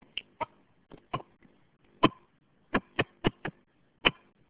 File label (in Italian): chioccia